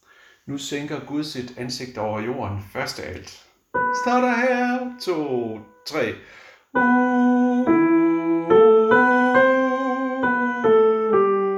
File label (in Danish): alt, koret; Nu sænker gud; harlev koret